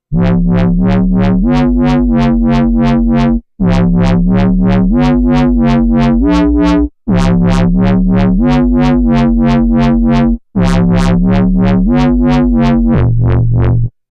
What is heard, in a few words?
Wub Club, KORG Node